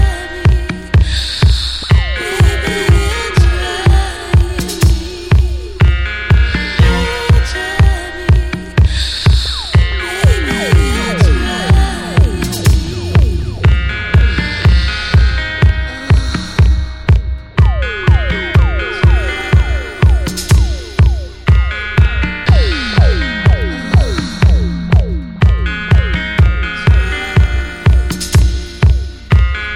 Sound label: love strings; Disco